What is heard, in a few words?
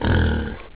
pig